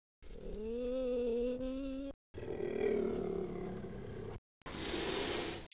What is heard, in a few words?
Acinonyx jubatus agressive